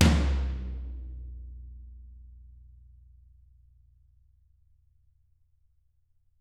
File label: TT, Toms; Bob Clearmountain Drums I